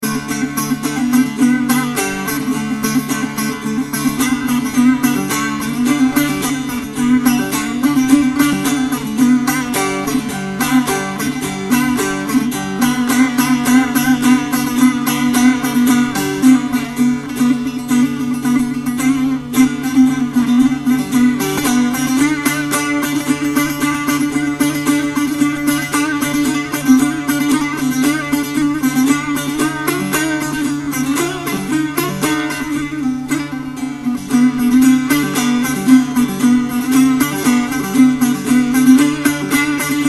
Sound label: tar; iran